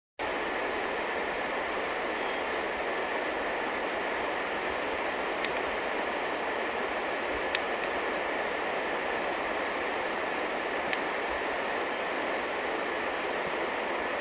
canto do rabo de espinho

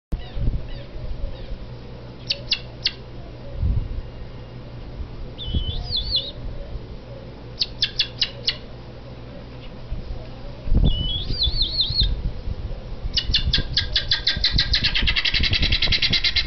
canto da galinha da angola

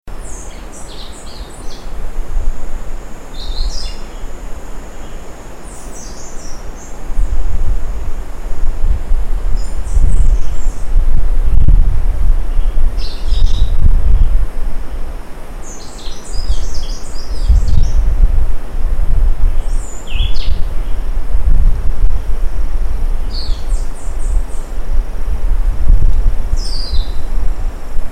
canto do sabia preto

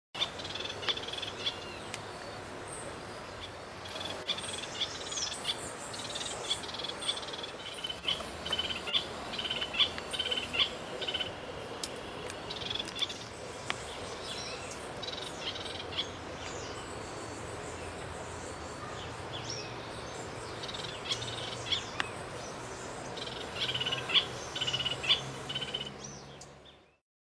Canto do joao baiano

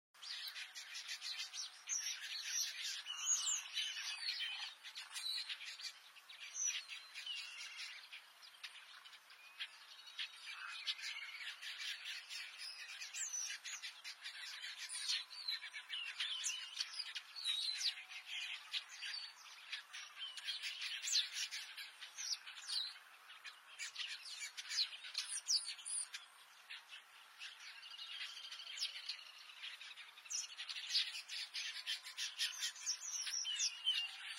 Canto do quelea de bico vermelho

